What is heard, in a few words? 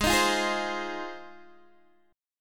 Ab 6th Ab, Guitar, DADGAD